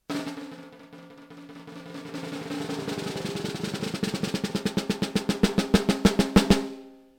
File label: snare met; trixon